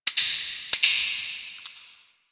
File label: Sparks; Ambience; Warframe; The New War; Quests; Zariman